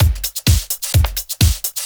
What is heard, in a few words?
Clubhouse Loop 128 BPM